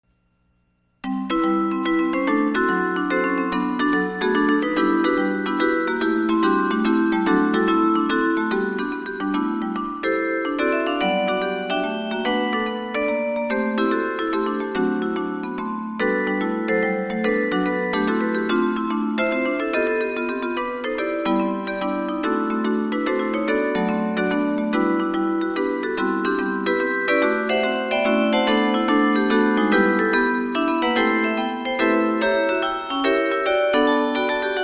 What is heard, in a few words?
waltz